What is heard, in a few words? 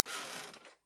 Bed Creak